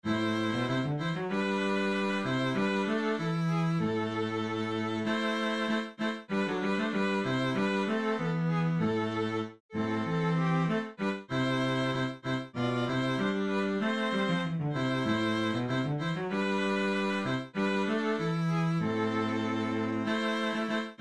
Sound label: Helas Full